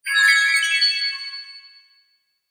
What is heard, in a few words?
gun chime; Lemons DLive